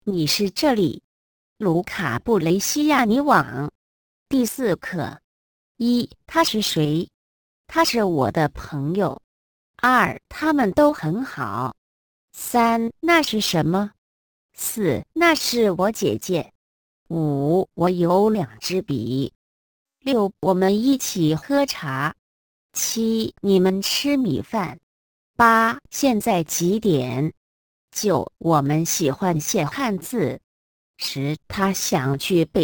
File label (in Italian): dettato